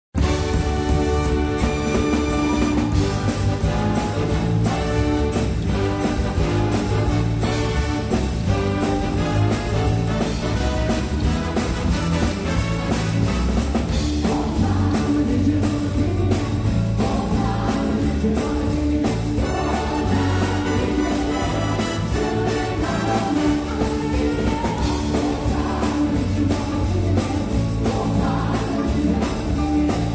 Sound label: Old time relgion gospel